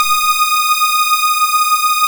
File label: SYNTH LAYER; InVision Interactive; Keith Emerson; The Most Dangerous Synth and Organ